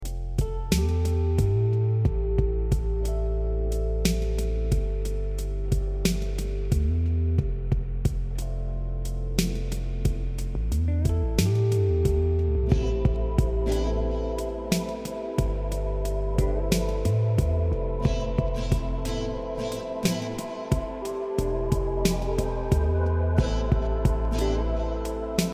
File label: instrumental